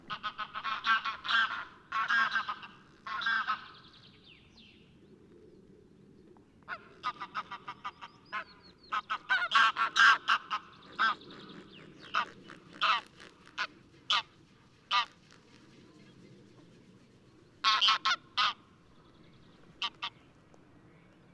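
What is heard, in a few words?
greylag goose anser, flight call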